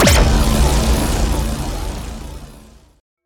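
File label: Sci weapon Shot Beam Large Industrial Grinder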